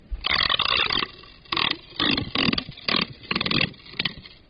キョロロロ・・・, 生活音; 生活音系効果音

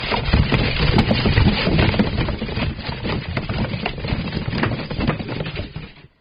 ガタガタ・・・ゴトゴト・・・, 生活音; 生活音系効果音

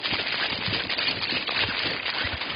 ジャラジャラ・・・, 生活音; 生活音系効果音

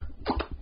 パクッ・・・, 生活音; 生活音系効果音

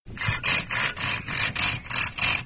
ギコギコ・・・, 生活音; 生活音系効果音

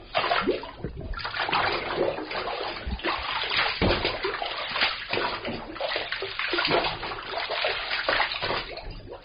水, 生活音; 生活音系効果音

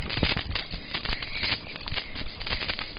ジャラジャラ・・・, 生活音; 生活音系効果音

